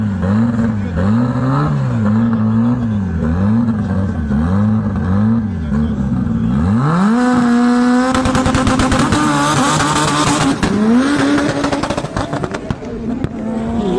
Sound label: Honda Civic Dragster